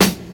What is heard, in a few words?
focused acoustic snare sample g key, snare sound tuned to the G note; snare drum samples